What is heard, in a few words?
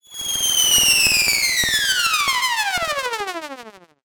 descending star fluttering descending shrill; sound design